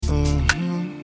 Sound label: uhm hmm